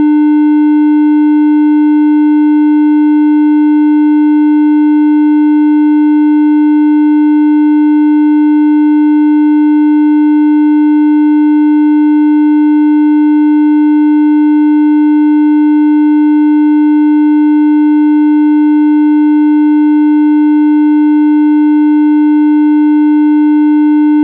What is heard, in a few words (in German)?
wellen quantisierung 4 Bit; raumfahrtmission kameras und analog vs digital